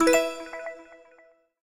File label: countUp Loop End; twin spin